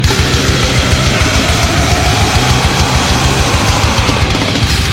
metal scream

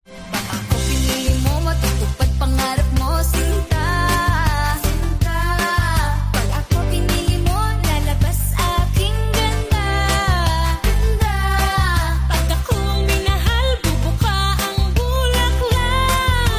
ouhlalahahaha

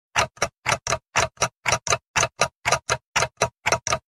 fast tick tock

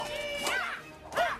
heyaaaa

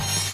confirm

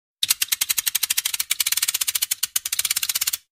rewind

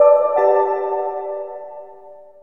windows 7 startup; rog

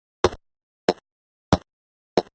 footstep; telefragger

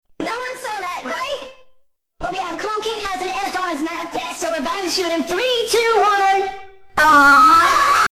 scream

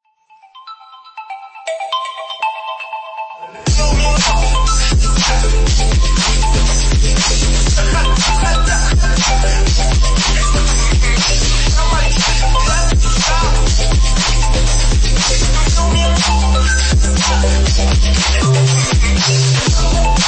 realme note 60 remix

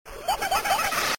laugh

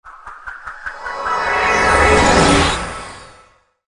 blessed shield

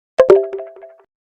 invite sound

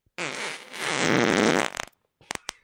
mega wet fart

